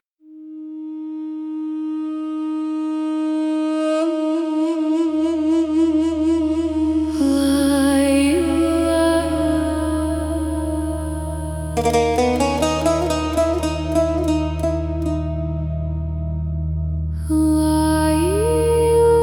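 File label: hypnotic mantra; mantry affirmacii; gipnoticheskaya mantra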